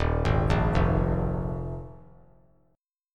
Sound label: D sharp Suspended 2nd Suspended 4th D sharp; arpeggiated; JGuitar